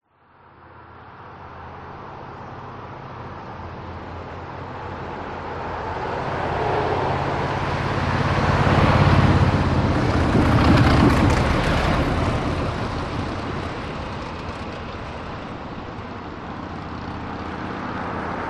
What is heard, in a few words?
vehicles traffic pass by 60kph through traffic village australia